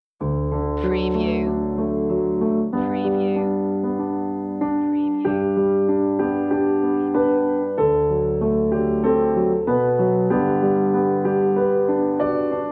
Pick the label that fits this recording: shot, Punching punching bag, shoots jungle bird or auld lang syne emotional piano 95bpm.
auld lang syne emotional piano 95bpm